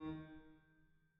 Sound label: harm D#3, Grand Piano; bitKlavier Grand; Piano Bar